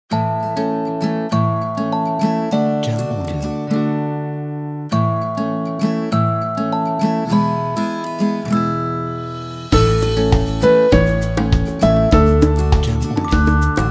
Upbeat Music

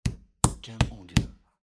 Beef Beating

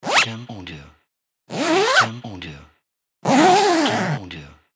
Jacket Zipper

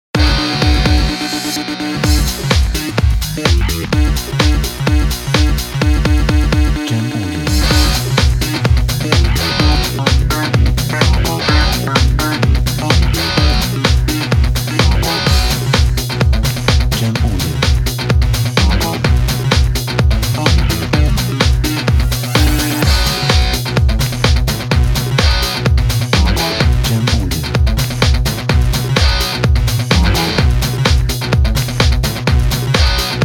Electro Rock

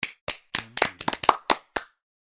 Clapping

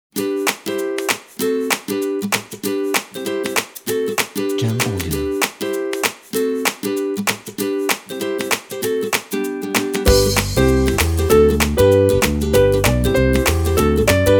Upbeat Happy

